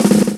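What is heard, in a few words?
drum roll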